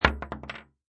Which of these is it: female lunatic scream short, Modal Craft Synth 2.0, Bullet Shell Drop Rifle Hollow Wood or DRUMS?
Bullet Shell Drop Rifle Hollow Wood